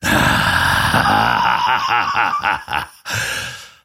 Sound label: grimstroke laugh; dota